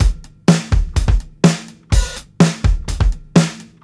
125 16TH KICKS, DRUMS LOOPS; BASEMENT DRUMS VOL 2

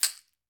CAXIXI HI, Latin Shakers

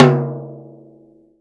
J T 3 FFF, TOM JAZ

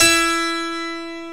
HARPSICHORD

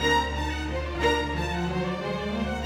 Strings; Sonic Foundry (Sony Creative Software); Orchestral VOL-2; Modern 07

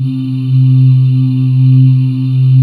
C3, Choir Acoustic & Synth; USB Soundscan vol.28 - Choir Acoustic & Synth; AKAI; ANGEAILES

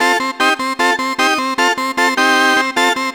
Surf Organ; Dr. Fink's Funk Factory

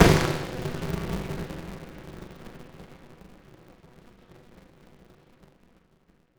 Rubble Snap; Zero-G; Soundclash Nu Dance Electro; Acidized Wavs; FX Atmospheres

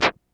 Clap Scratch; Lost Tapes From Mars; Clap & Rim

